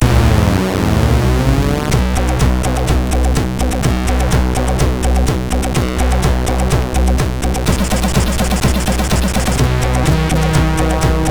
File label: Rough Theme, sid MOS6581R4